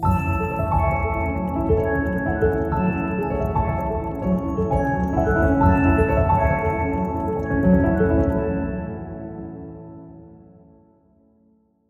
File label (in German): Zielmusik